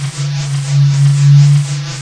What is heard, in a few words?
GLOWBALL; SFX; PC Gamer IT CD 38; thief